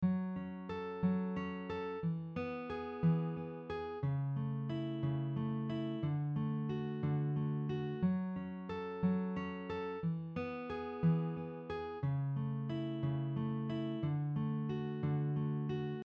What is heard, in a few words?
Spread triad, guitar